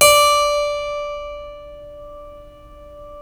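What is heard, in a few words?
D4 clavinet left, Keyboards Acoustic; USB Soundscan vol.17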